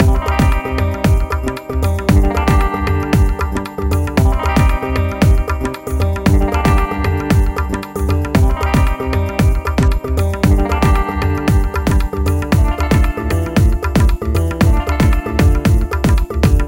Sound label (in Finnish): Kitara Juttu